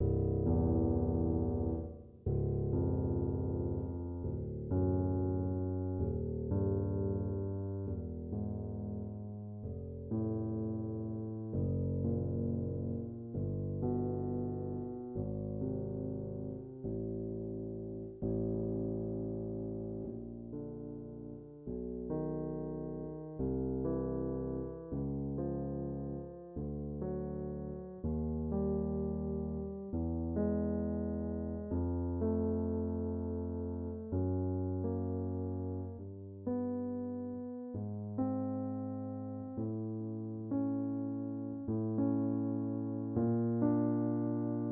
Piano Replacement Better 10ths Test Starting on lowest C